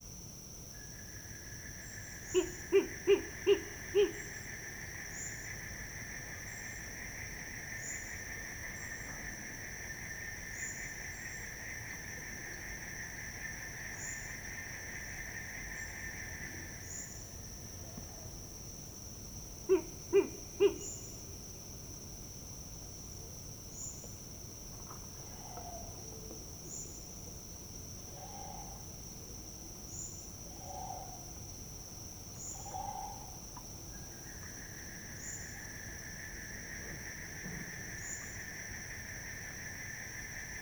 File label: Omani Owl Three Series Of Distance Calls A Reaction To Playback